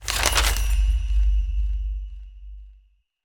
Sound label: intel grab